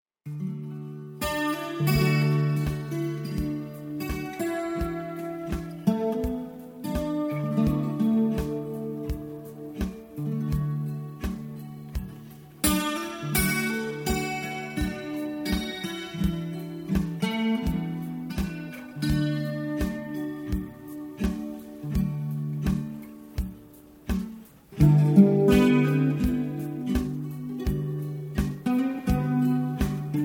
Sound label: No Vocal